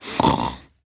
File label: Snorts, Grandmother, Vocalizations; Warframe; Deimos